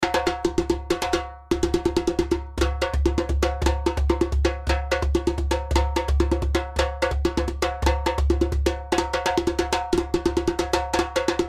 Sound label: djembe master